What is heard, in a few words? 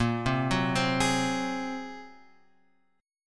A sharp 7th Suspended 2nd Sharp 5th; A#7sus2#5 Chord {6 3 4 5 X 4} arpeggiated